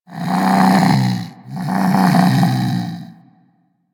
Vocal Snore D; Warframe; Hunting Critters; Vulpaphyla

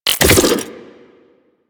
Rifle Reload; DE; Warframe; Weapons; Sentient; Sent Rifle New War

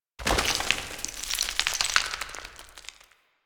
Tower Slate Slide; Warframe; Quests; TheNewWar; Ambience; Cetus; Unum Tower

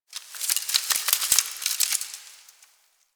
Foliage Rustle; Warframe; Quests; TheNewWar; Ambience; Zariman

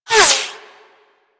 Bullet Whizzby; Warframe; Weapons

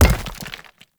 Hammer Impact Rock; Weapon Impacts; Player; Environment; Tuvalkane Hammer

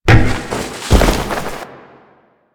APC Dismount Foley; Enemies; Lasrian; Warframe

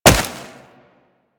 Wall Jump Snow; Footsteps; Warframe; Tenno; Wall Run

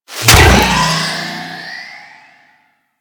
Smite Projectile Hit; Warframe; Warframes; Paladin

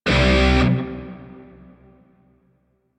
Shawzin Pent Maj Chord I; Music; DE; Warframe; Grineer Shawzin

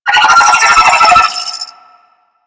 Space Drone Attack C; Warframe; Corpus Space Drone

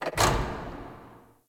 Worker Lever Pull; ambience; props; one offs; Warframe; Venus Open World; Venus Solaris United

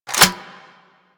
Reload Grineer Cannon Insert C

